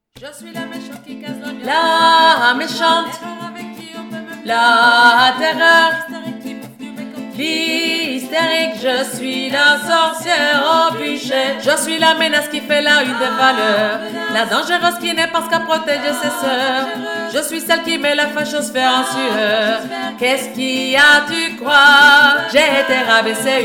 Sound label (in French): VOIX 3